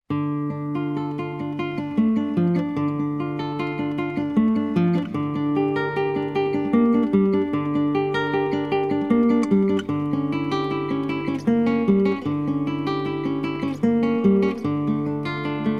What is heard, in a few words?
Prelude In D Minor, Guitar